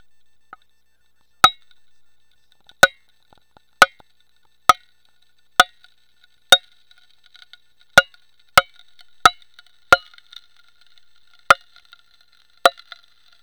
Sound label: Original Cracking